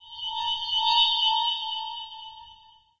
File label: Sonic